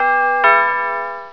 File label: doorbell; Broderbund Demo